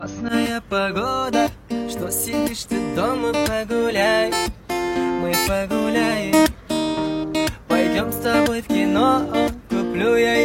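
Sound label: Acoustic Version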